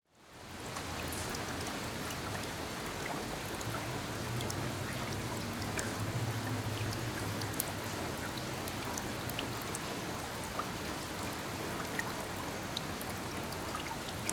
Nature, Ambience

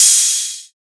Ride, Techno Drum One-Shots; Ghosthack - Free Techno Drum Shots 2024

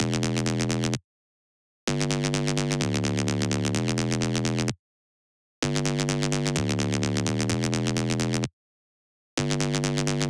128 BPM E Min Bass; Drop Loops; Goliath

